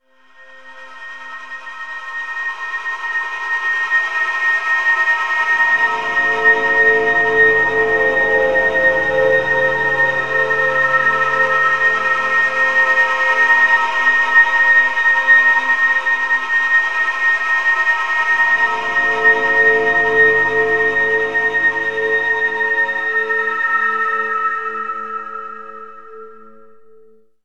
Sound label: Sniper Tension Overlay; medal of honor warfighter; Bainbridge